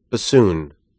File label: bassoon